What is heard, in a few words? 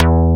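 Hammer Bass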